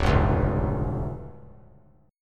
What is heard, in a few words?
D Minor Major 7th Double Flat 5th, D; DmM7bb5; strummed; JGuitar